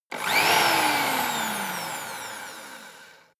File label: vacuum cleaner hand-held on off short